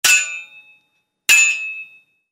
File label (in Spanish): golpe; ruidos